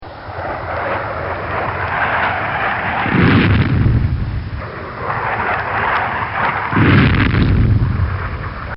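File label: F-16 Falcon Drop 500 lb Bombs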